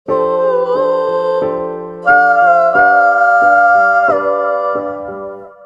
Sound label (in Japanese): hamori semitone